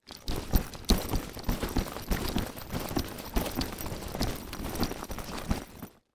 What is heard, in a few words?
Sports Bag Duffle Bag Rattle Fast